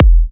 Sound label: Kicks E; markus hakala